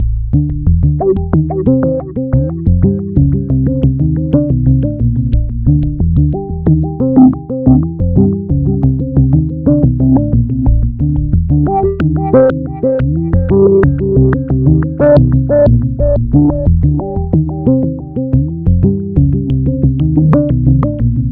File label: acid wall 90